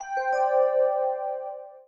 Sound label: New Mail, notification; microsoft office mac